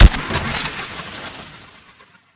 atk mech warble tail; Titanfall 2

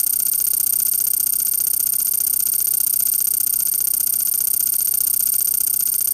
Bounty Hunt Bank Bonus Pts Ticker; Titanfall 2

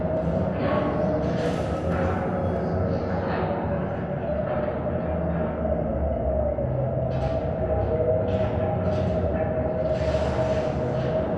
Robot Arm Track Slow Dist; Boomtown; Titanfall 2

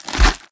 Stalker Mvmt Synced Melee Spinepunch Extract; Titanfall 2

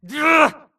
grunt death quick; Titanfall 2

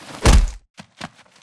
fight knockback winner; Titanfall 2

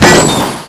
bolt loose; Titanfall 2

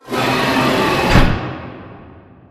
Assembly Floor Close; Boomtown; Titanfall 2

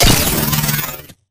Nuke Crit Impact; AI Stalker; Titanfall 2

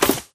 control room panel activate; Titanfall 2

